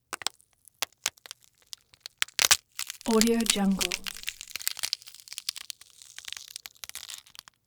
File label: crunch celery